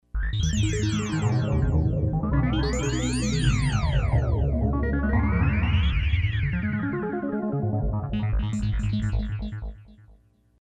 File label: arpeggio; Kris Rhen Presets